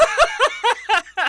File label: Laugh